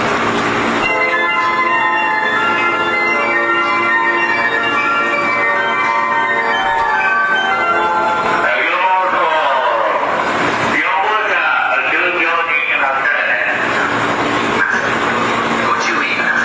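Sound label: Departure Melody, 急病人発生バージョン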